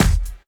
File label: combi drums